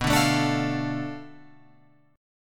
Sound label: B Minor 7th Flat 5th B, Guitar Open F; Bm7b5; strummed; JGuitar